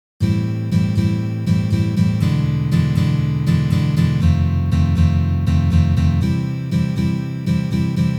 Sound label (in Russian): Uprazhneniya akkord Am7